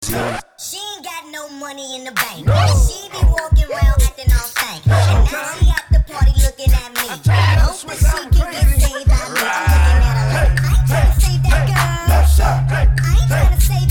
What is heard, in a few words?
funk; multitrax